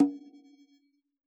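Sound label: conga high open, Yamaha DJX Rock Kit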